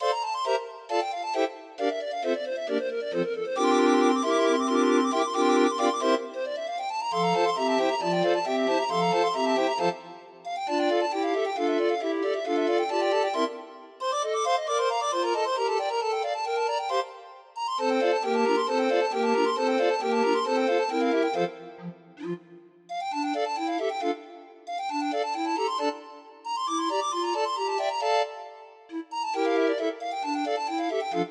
The Typewriter, Grind Organ

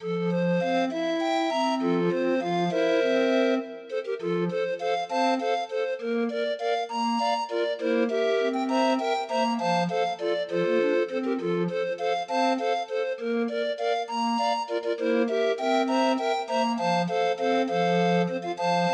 Grind Organ; Demo of 20 note MIDI file

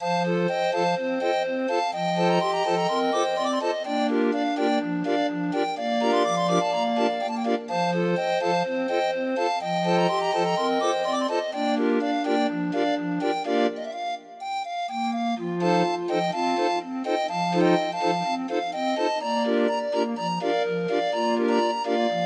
Grind Organ

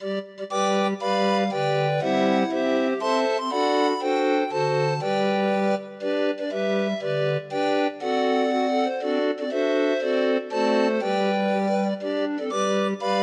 Happy Birthday, Grind Organ

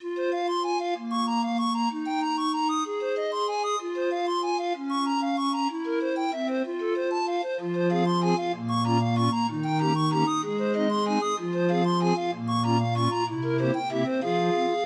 Alouette, 31 note, Grind Organ

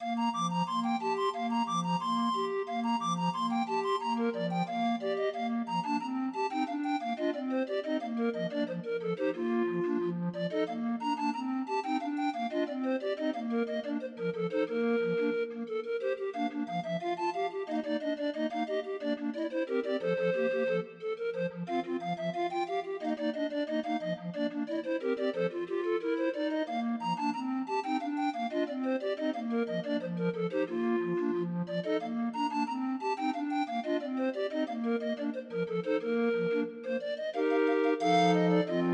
Grind Organ, 20 note MIDI file; Demo of 20 note MIDI file

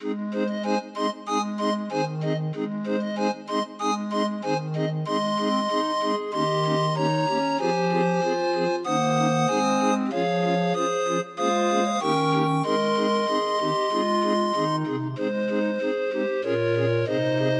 Abide With Me, 31 note MIDI, Grind Organ; Demo of 31 note MIDI file

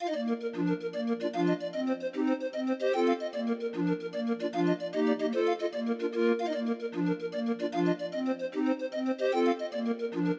Irish Washerwoman, Grind Organ, 20 note MIDI file

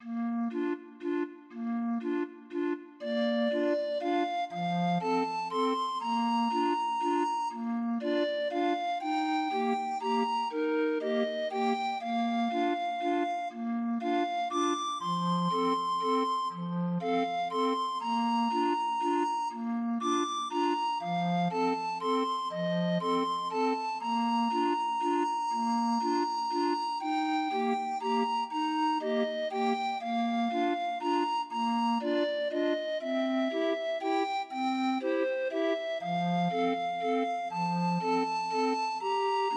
Grind Organ, Demo of 20 note MIDI file

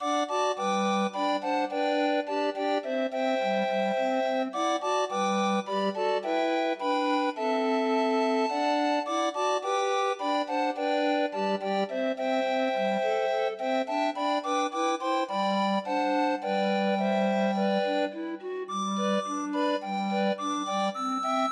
Sing Hosanna, Grind Organ

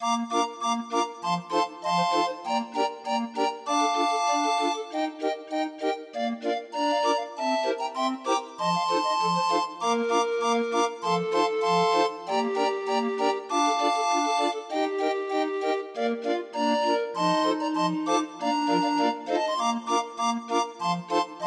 Jolly Old St Nicholas, Grind Organ

